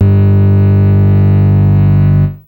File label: clean, synths dancerap; The Sample Bank